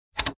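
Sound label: television button click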